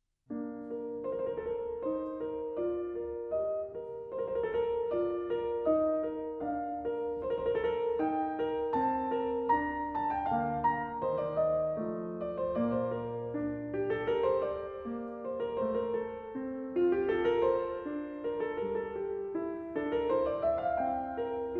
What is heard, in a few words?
Keyboard Partita in B-Flat; Keyboard Partita No. 1; BWV 825; I. Präludium